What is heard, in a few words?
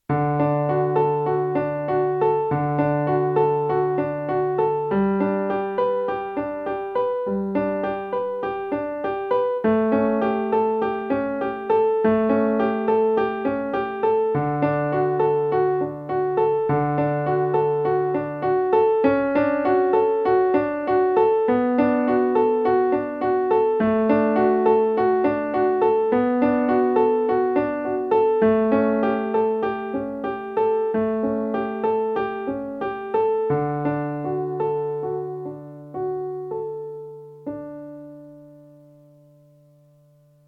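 prelude in d flat major transpose practice in d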